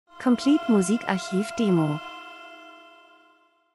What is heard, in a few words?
transition; Transition & Movement - Delay dunkel spannend